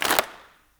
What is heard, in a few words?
GROUP CLAP, HANDCLAPS